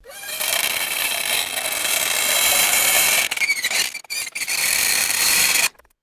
shrill dry ice screaming squeal

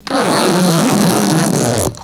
squeaky rubber balloon rubbing

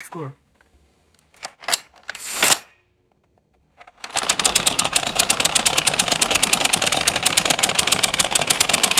chainsaw starter cord pulled repeatedly; tools

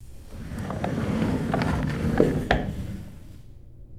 small computer chair rolling on hard floor

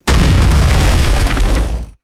heavy explosive impact and falling rock debris; destruction

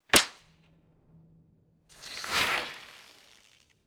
two arrow releases and flying whooshes; weapons

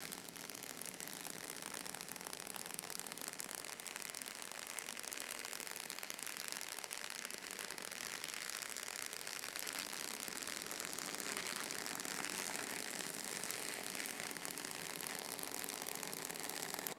slow continuous tissue paper crinkling

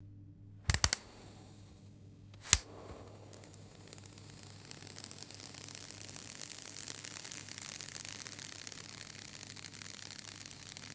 crispy candle wick igniting and crackling; fire

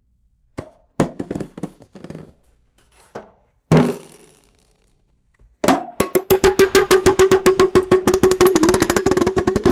hollow cardboard tube dropping and spinning; foley

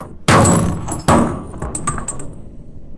heavy metal grenade rolling on stone; weapons

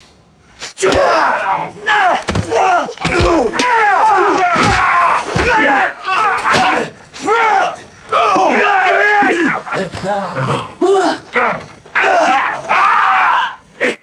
men grunting and scuffling during a fight

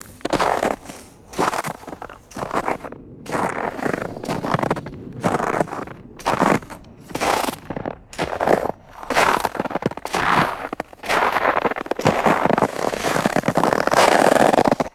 heavy footsteps crunching in snow

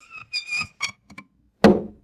squeaky cork pulled with hollow pop

